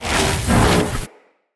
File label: Ground Slam Charge Weapon; Enemy; Abilities; Nimrod